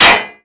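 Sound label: ricochet metal; firearms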